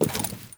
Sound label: Walk Default, Footsteps; Enemy; Lady Deora